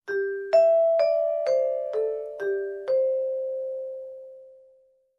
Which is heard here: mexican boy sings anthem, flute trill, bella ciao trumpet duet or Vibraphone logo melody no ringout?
Vibraphone logo melody no ringout